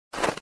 touch player; spider warrior

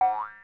spawn; burger clicker6

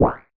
jump; danger sides

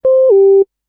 gameover; stick panda

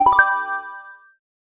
collect item; match drop

